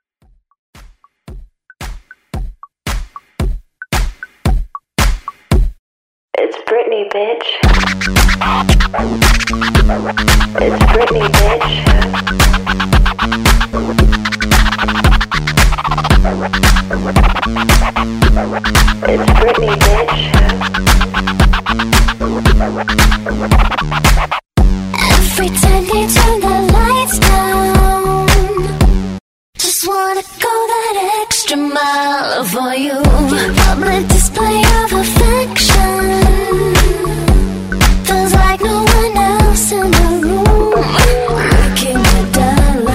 Scratch Cutz Mix

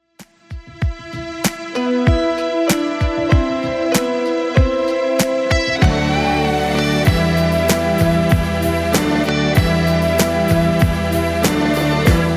60s, 96bpm; Redrum

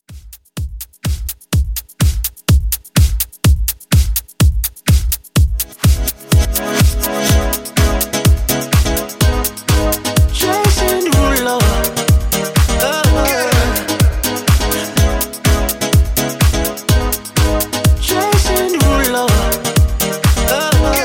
Dance Club 125Bpm

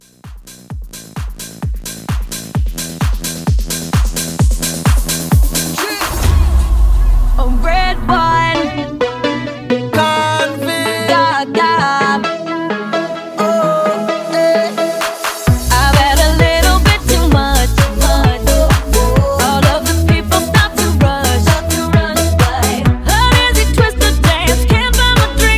Mashup 130

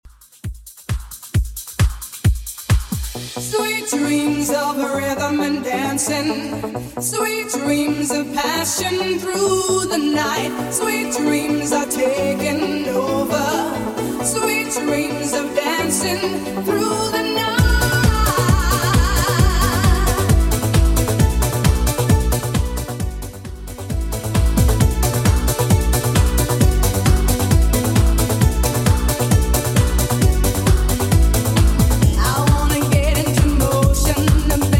90s Redrum 133bpm

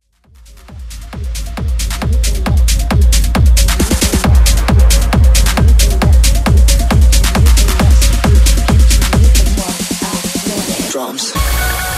DRUMS Halloween Intro Drop

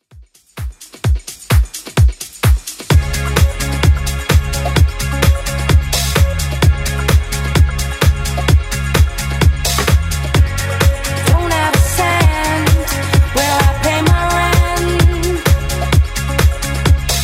129bpm

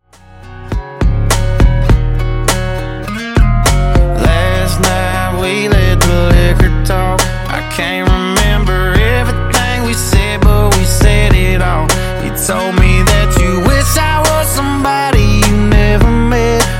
Funk Refix Basslines 102Bpm

